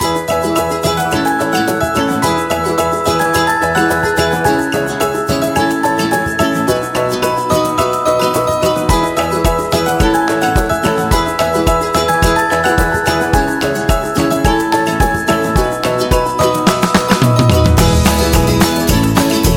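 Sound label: Food Industry, Upbeat and happy background music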